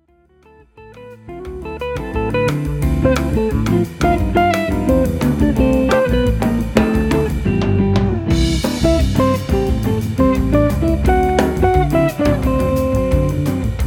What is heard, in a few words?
Latin Jazz Lounge